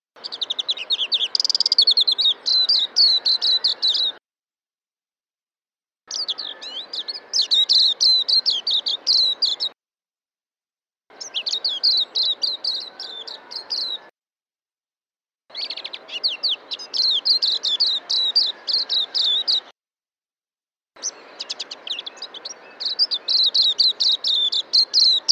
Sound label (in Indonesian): suara kicauan burung kacamata perak